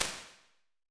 Big Bathroom; Convolution Reverb; IRs; Ableton

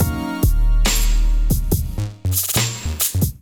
groove 140 bpm; MIDI Clips; Ableton; Factory Packs; Beat Tools

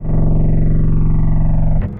Effects; Clockwerk; Ableton; Factory Packs; Granulator III

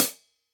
HIHAT closed tip tight

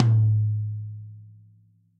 RACKTOM stick center, Tom; RACKTOM SR 1209 stick center; Ableton; Factory Packs; Drum Booth

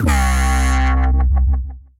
Bass; Maybe Hold; Ableton; Build and Drop

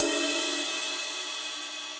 Ride Mid DarkPlateVerb; Drums; Drum Hits; Standard Hits; Ableton; Factory Packs; Session Drums Club

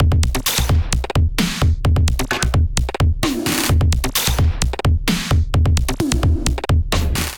Kit groove 130 bpm, Drum; MIDI Clips; Luminous Kit groove; Ableton; Factory Packs; Drum Essentials

